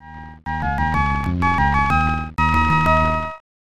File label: Level Complete; marko's magic football snes